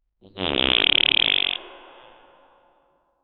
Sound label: realistic fart sound as i; swamp